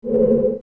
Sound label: Z Target Center; Zelda; OOT